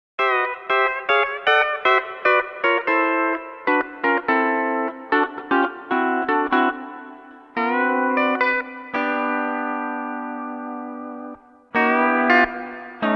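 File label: No Pedals, D13 steel guitar; D13 Copedant Tabs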